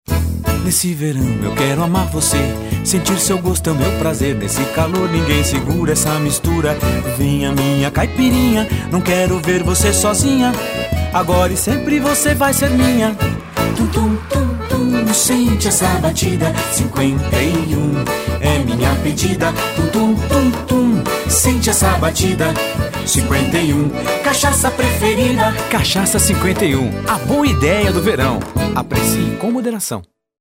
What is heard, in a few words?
jingle; CANINHA 51